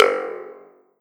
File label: Perc Thundertube; pitchglitch